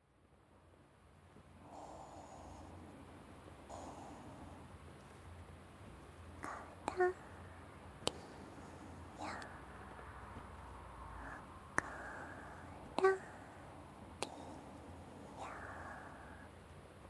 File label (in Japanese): 添い寝ララバイ; 優しいお姉ちゃんが、いろいろとお世話してくれました。; JON